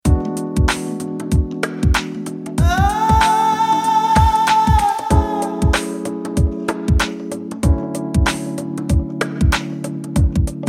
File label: Kiwi Groove